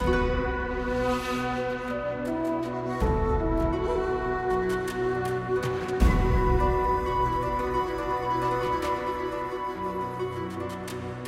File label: Music Party; Naruto Ultimate Storm; android; StudioDOMA Kihan